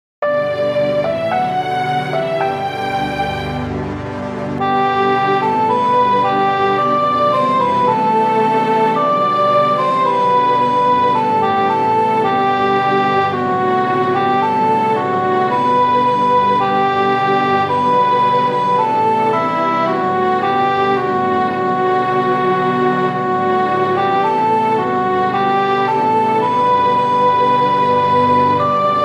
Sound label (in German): singt ein neues lied keyb